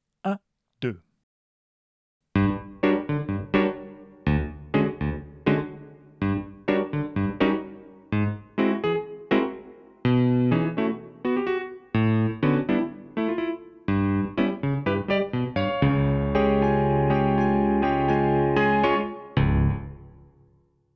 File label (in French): seul piano; refrain chanson, accompagnement piano seul; Radio France